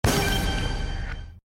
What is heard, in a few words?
notification